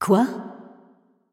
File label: confused; Smite; athena